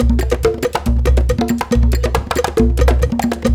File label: 138, Lead Drm, Gyana HiLife; Spectrasonics; Supreme Beats